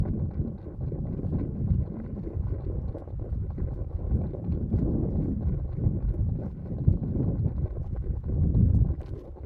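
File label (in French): Chili Atacama geysers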